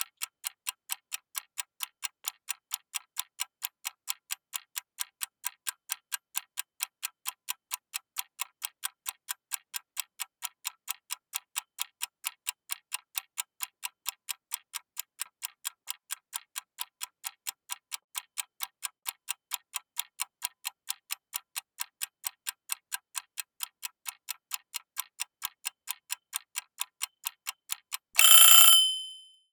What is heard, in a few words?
Timer